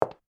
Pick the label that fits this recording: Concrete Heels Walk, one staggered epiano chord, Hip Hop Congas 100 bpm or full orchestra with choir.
Concrete Heels Walk